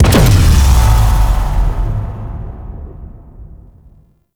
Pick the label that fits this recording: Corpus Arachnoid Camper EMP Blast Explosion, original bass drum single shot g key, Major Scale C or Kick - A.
Corpus Arachnoid Camper EMP Blast Explosion